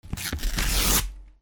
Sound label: RIPPING